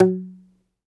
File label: DOMRA, Segundo Domra, Plucked Strings